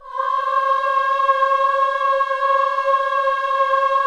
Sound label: KIDS CHOIR, Gospel